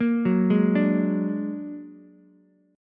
A sharp Augmented 7th A sharp Ukulele; A#+7 arpeggiated; JGuitar

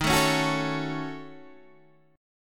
Eb Minor 7th Flat 5th, Guitar DADGAD

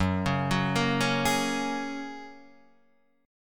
F sharp Major Add 9th, Guitar Standard; F#add9 arpeggiated

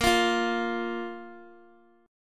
Bb 5th, Guitar; 17,20,17,x,x,x; 4F,4A,5C,5G,5C,6E; Bb5 strummed; JGuitar

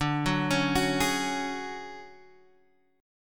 D-Major Sharp 11th, D, Guitar, Open G

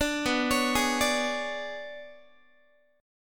B-Minor 6th Add 9th B down Guitar; arpeggiated; 4A,4E,5A,5D,5G#,6C#; 17,19,16,18,18,x

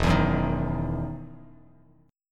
E Major 7th Suspended 2nd E down Bass Standard

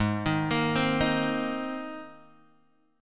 G sharp Major Add 9th, Guitar Open G

